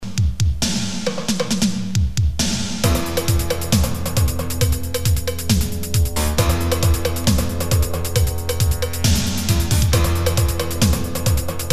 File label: rock